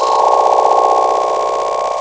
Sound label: flute to base high